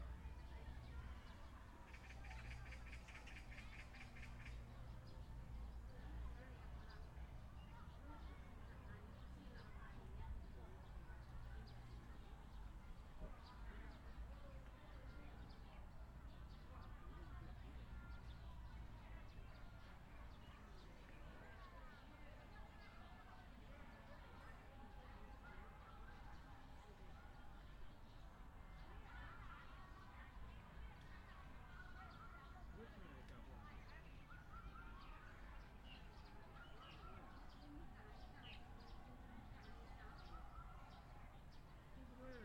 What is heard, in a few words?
Qingdao Park Birds; natural sounds